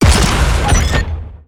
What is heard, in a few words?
railgun sentry shoot